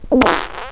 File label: Liquid, Prout & Burp